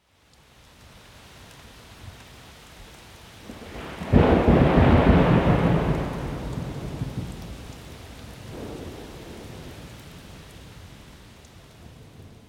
Thunder Storm

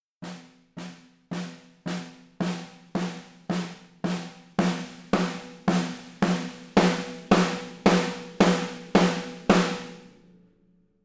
6.5x14 Ambience Only; GMS Custom Nir-Z

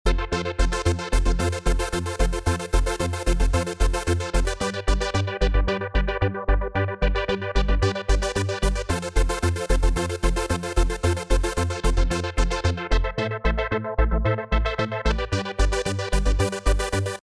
Easy Dance with Synth Layers; Electra

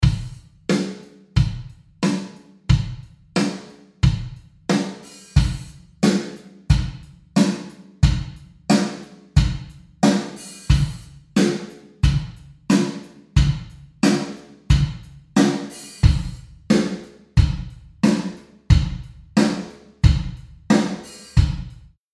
DRUM IR SETUPS WIDE

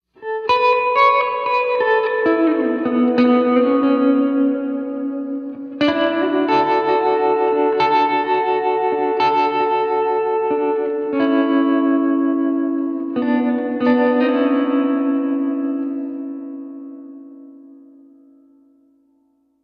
Electric Guitar Spring Verb; Spring Thing

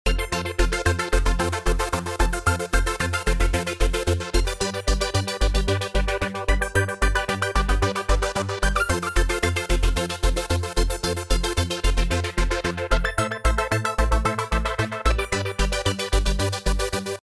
Easy Dance with Synth Layers; Electra

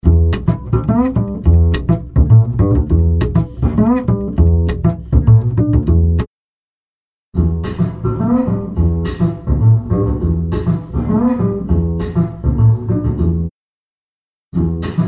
Dry Bass Room Bass Altiverb

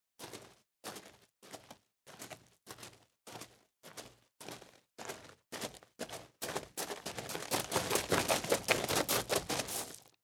GRAVEL Mountain Boots

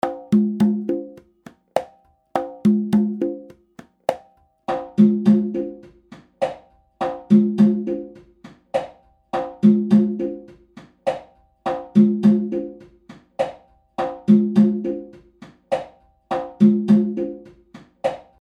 CONGAS DRY ALTIVERB

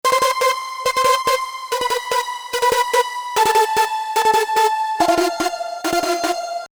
hi synth

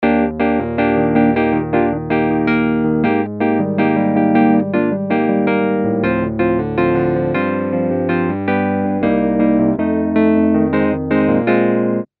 Pianet DI med dynamic

